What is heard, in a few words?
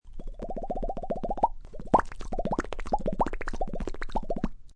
Mouth FX Bubbling, Mouth effects, bubbling noise (human voice)